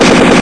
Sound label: mgun; MapleShrine; mkxp; gameasync